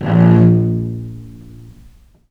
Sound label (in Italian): A#0, Historical Instruments, VIOLE MUT; USB Soundscan vol.23; AKAI